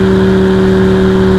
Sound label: Rolls Royce Cullinan Low